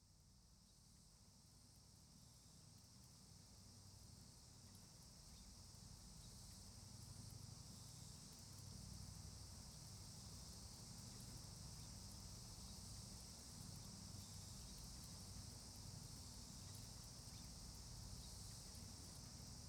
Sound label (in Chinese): 炭火的燃烧声。烤食材的声音。享受炭火烤石炭的声音